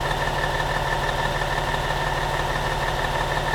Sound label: car motor